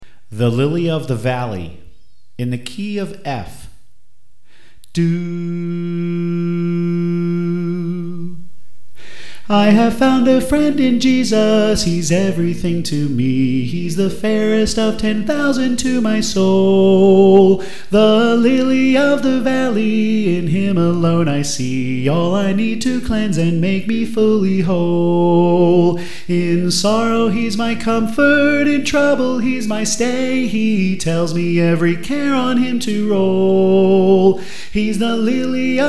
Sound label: Soprano